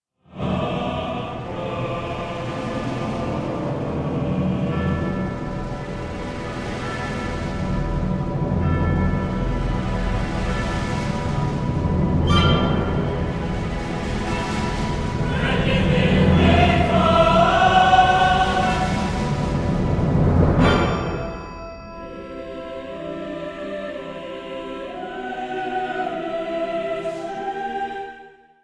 cantata for choir and orchestra; iceland cantata op 13; 7th mvt

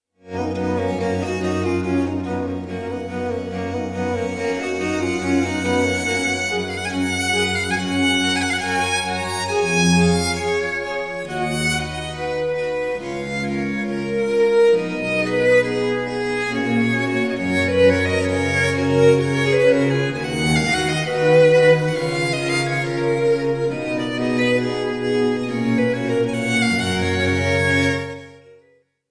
quintet in G major for 3 violins viola and bass; 1773

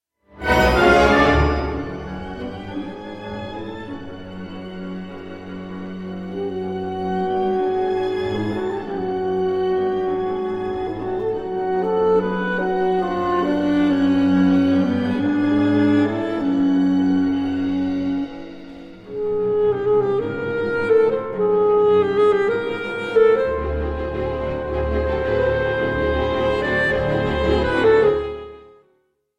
rhapsody for alto saxophone; claude debussy

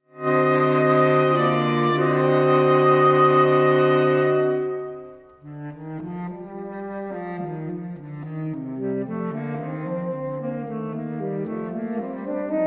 saxophone quartet; amstel saxophone quartet; peteris vasks; encounter

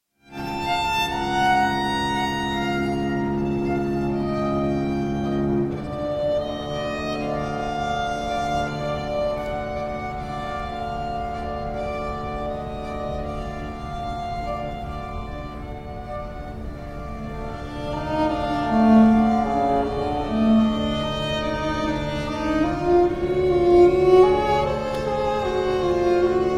string quartet in f sharp minor

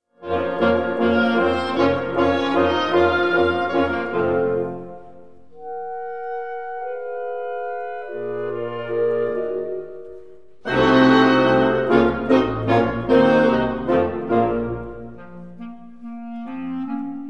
serenade in B flat major for 13 wind instruments; wolfgang amadeus mozart; 4th movement; menuett

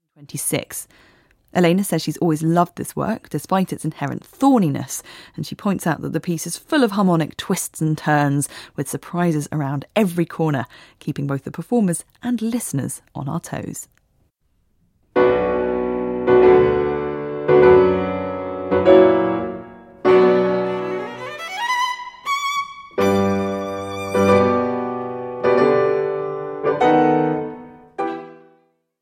rondo brillant in b minor for violin and piano; franz schubert; d 895

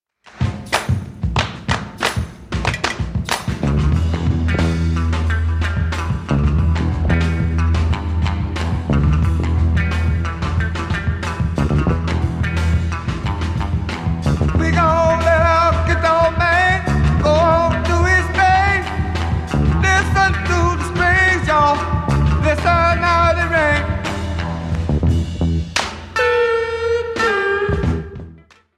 hand clapping song

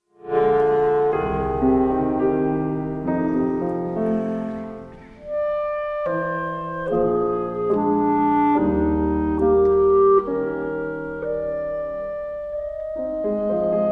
sonata in f minor for clarinet and piano; op 120 1

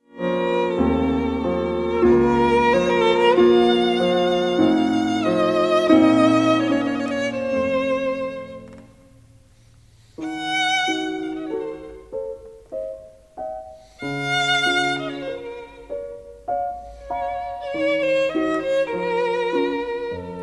violin sonata in b flat k454; wolfgang amadeus mozart

